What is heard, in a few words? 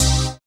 HOUSE, House Dance